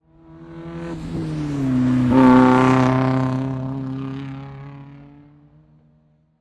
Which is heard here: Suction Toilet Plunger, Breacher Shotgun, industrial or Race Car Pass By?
Race Car Pass By